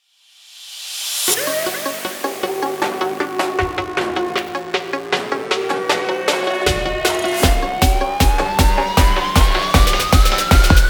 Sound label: sport action music